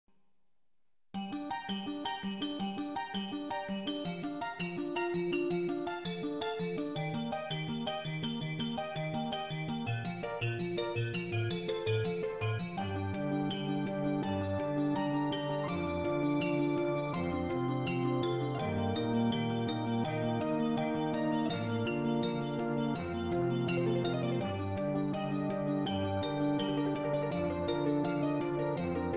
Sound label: With Ambience